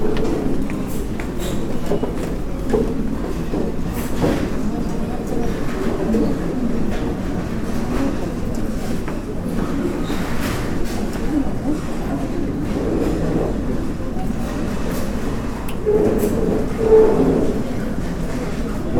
employee cafeteria; tokyo

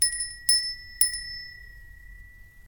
wind bell; jidaigeki

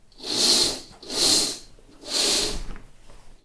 long curtain

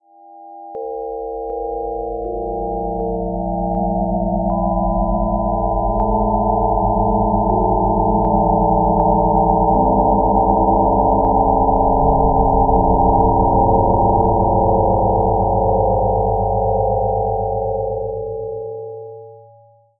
death sound, horror

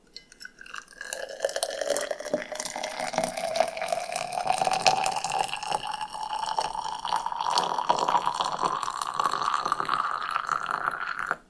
pouring into bottle; eating

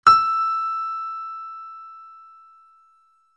piano E

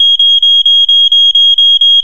pager

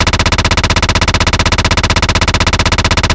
machinegun; arms

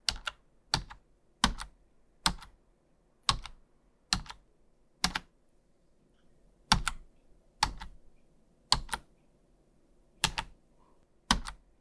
type in

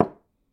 knock on thin board; knocking

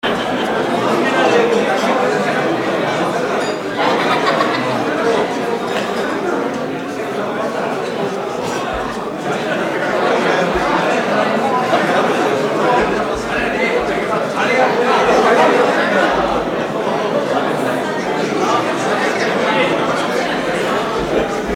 party hubbub

